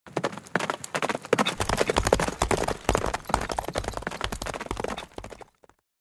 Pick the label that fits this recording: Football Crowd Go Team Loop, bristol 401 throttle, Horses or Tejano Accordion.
Horses